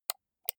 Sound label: computer mouse right click slow